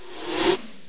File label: turning